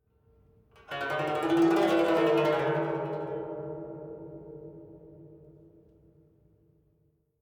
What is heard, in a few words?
Savannah Music